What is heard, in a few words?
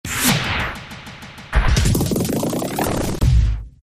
Swoosh